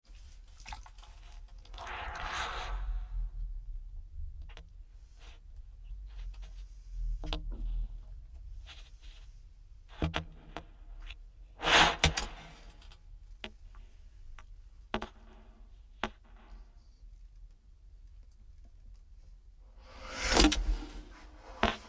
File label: stutter